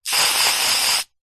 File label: household aerosol deodorant medium spray; zapsplat